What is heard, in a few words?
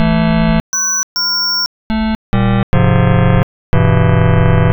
first own harmonics, synth